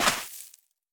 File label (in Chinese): 可疑的沙子踩踏声